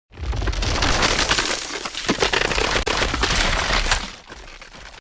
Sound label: Crack